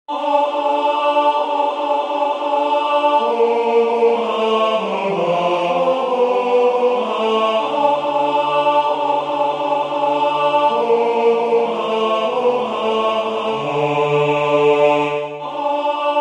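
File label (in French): tenor, Musique sacrée; Venez Sauveur aimable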